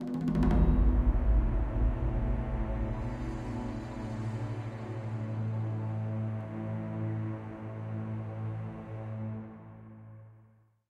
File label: Spotted Stinger; Macau Fort Yudayong; Assassin's Creed Chronicles China